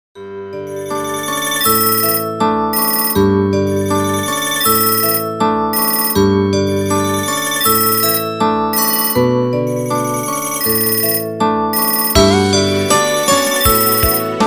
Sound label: Airy Nokia Ringtone